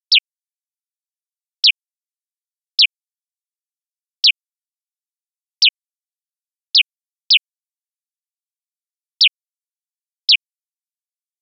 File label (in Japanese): suzume